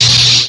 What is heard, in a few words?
lt saber hit, sabre laser; effets speciaux